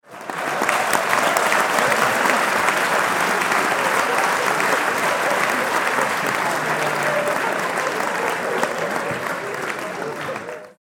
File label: Clapping audience